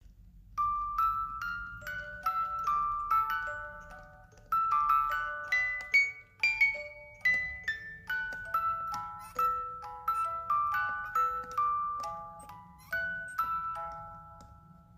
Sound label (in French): 18lames, boite a musique; Boîte à musique en bois personnalisable